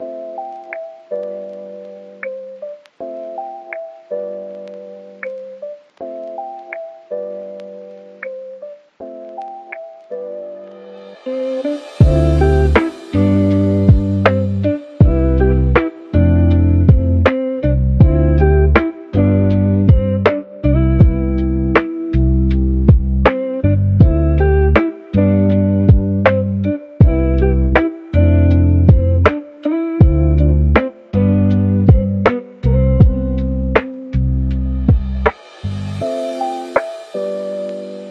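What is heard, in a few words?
Jazzy, Modern lo-fi and retro background music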